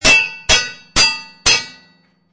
Metal hits. Blacksmith hammering a piece of solid metal on anvil. Four hammer impacts; Hammers